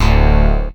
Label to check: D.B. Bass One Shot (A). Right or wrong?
Right